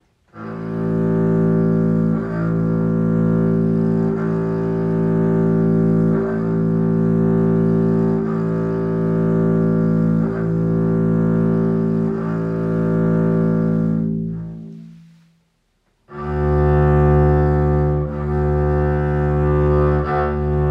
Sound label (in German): Rote Rosen langsam arco Bass